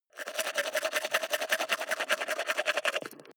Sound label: teeth brush wet open inward fast